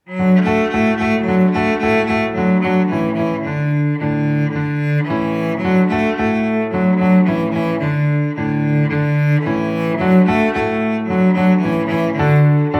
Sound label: D cello; Marko skače